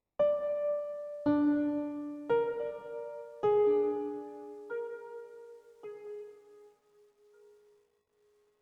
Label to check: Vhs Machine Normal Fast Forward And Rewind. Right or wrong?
Wrong